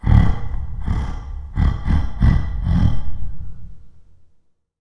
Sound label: Gorilla